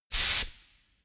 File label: pneumatic pump